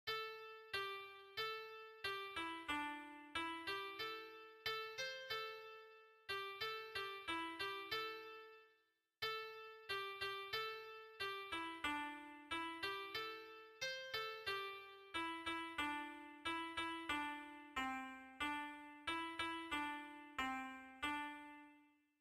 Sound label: ambient japanese music